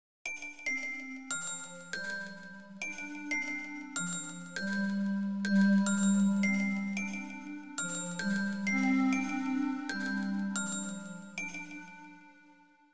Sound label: Dream Bells